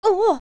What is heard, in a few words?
young ledge fall; Link falling and grabbing a ledge